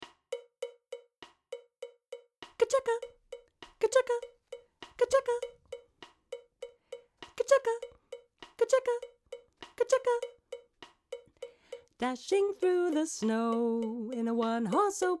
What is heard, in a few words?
Jingle Bells soprano